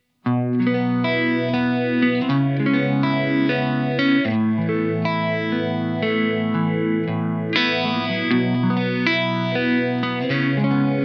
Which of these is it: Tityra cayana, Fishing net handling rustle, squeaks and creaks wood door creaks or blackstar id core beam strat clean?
blackstar id core beam strat clean